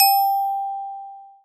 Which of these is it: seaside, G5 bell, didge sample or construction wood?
G5 bell